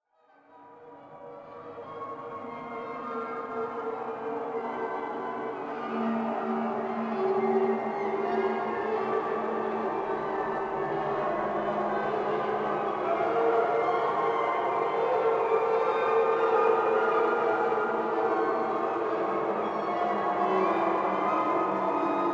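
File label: Ambience Elevator; dying light gamerip 2015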